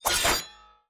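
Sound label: cavalry spear, weapon; Battle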